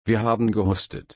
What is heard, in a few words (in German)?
husten